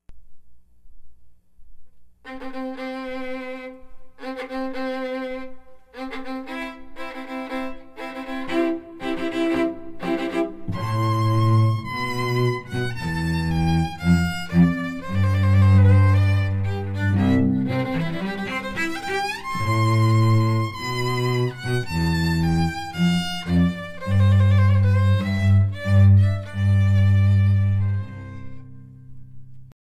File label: strings